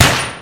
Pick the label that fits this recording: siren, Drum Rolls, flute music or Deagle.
Deagle